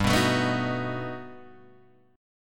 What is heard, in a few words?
G Augmented Major 9th, Guitar, Open G